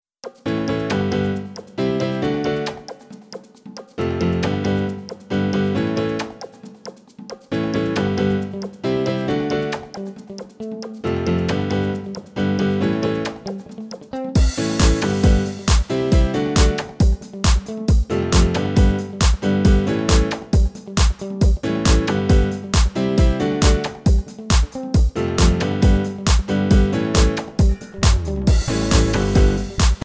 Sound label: Off Melody, funk